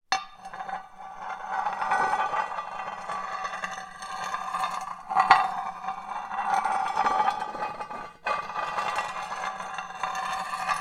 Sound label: foley metal scrape drag hard floor